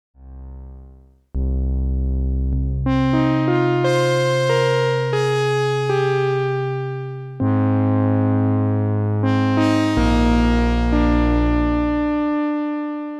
Sonicware Texture Lab EFX Mode Prophet 5 Shimmer Reverb